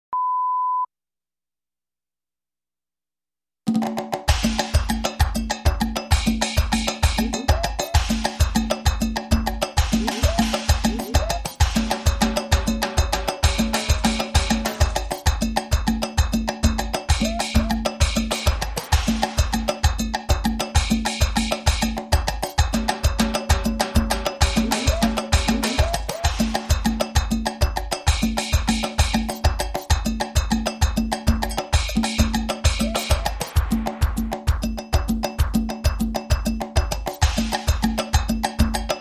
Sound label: Percussion